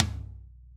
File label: tom, Roland MC-909